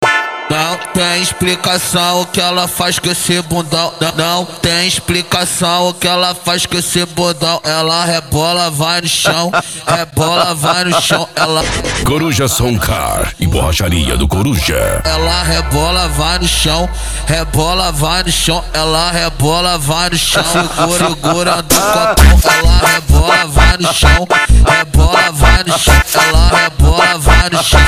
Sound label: funk; coruja som car e borracharia do coruja